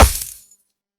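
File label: Bullet hit ground; Hovl Studio; SFX Battle sounds; WhiteMan Unity2D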